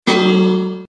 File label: minecraft bell sound effect